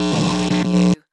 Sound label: Electric Zap